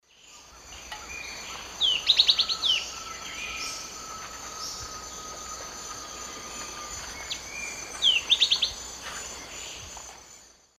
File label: Myrmotherula menetriesii, call, S. America Eastern Lowlands